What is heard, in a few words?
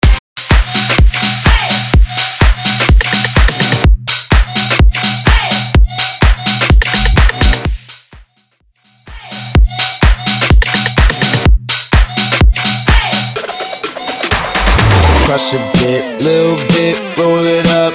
Bass House